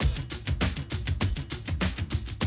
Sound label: drum loop, 100 BPM